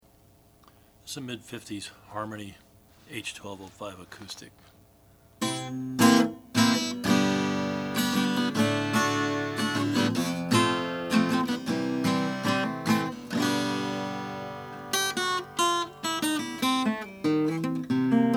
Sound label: harmony h1205 maple; 1955; natural